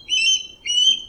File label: osprey